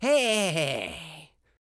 cheer; L2 DElf

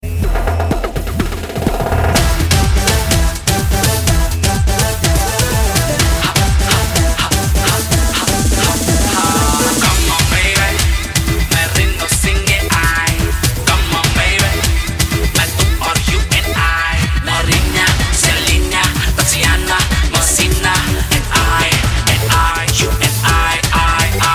Xperia Ringtone; Xperia Tipo

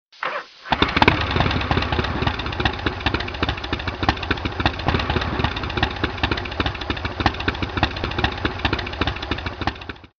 Harley Startup

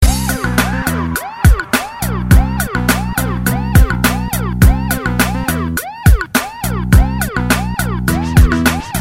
Cool police sirene

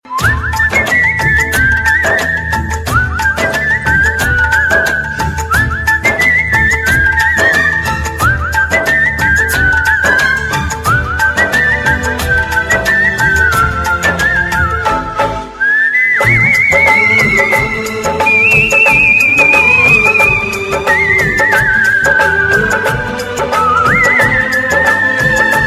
Instrumental Whistle; meloboom

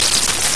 Clucky

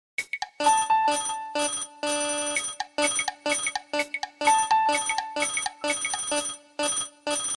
Elevator Nokia 3120

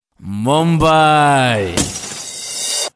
Msg Tone

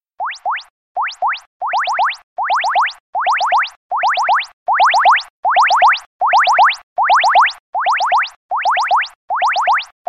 HTC ALARM; HTC 1 NEW

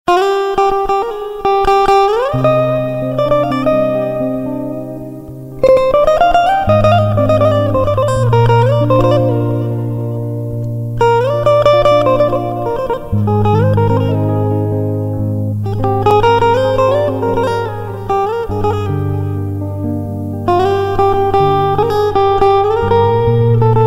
Lovely Guitar

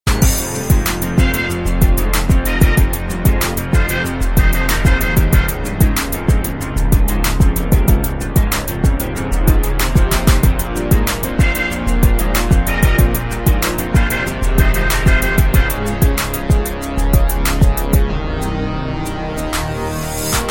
Sick Beat

